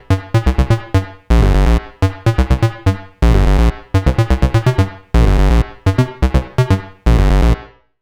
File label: Modular Sequence Reflections